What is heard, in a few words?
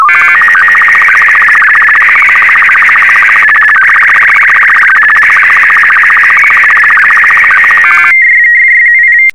sstv; R8BW